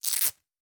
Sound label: Velcro, cloth rip; WeeklySFX